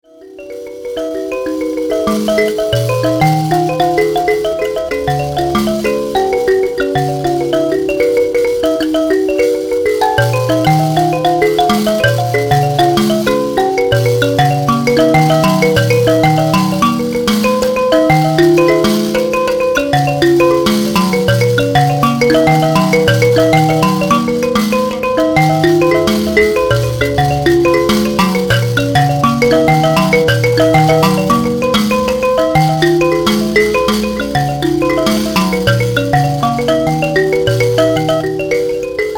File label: mbira